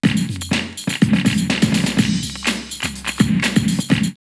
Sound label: Beatmaster 124, drumloops, Techno&House; X-Static Goldmine